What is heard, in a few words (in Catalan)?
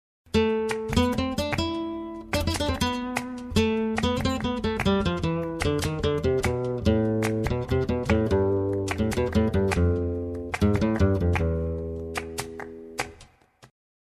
Dictat Melodic Flamenc